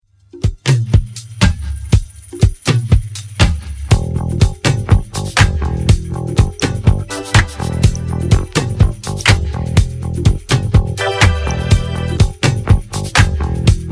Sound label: rock hard instrumental